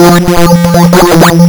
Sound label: lsdj waves; wavetables